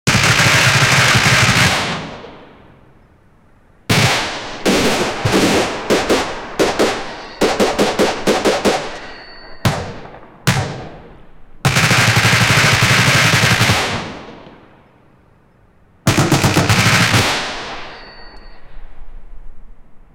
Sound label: machine gun shoots exploi, bomb